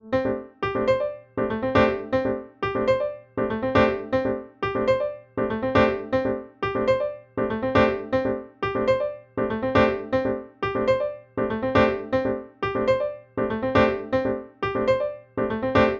dry, piano; THE GRANDEUR (Kontakt-based piano library from Native Instruments); Newfangled Audio

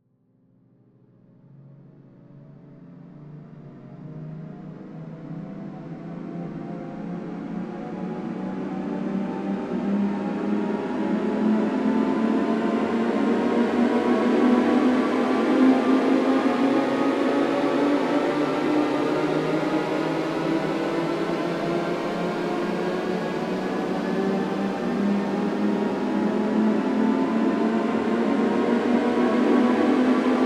Guitar Harm Shepard

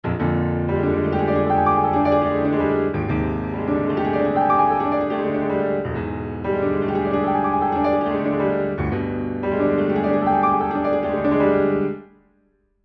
Steinway B mid

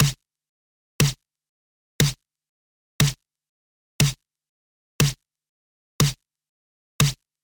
Snare, Dirt, feedback echo; Newfangled Audio

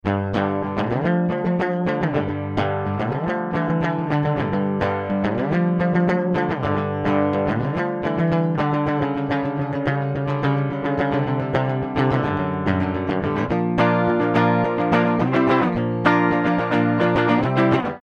Rhythm Guitar No Neve; UAD Spark

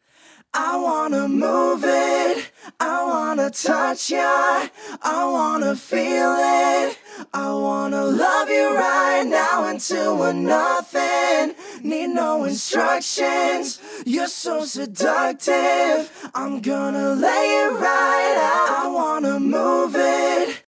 vocals no effect

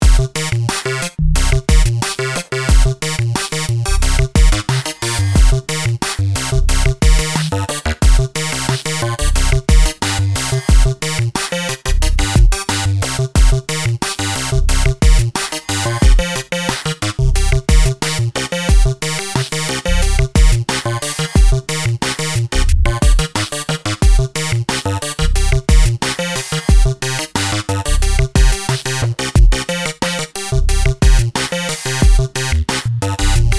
evolve drums; Numerology; Five12; Auto-evolve

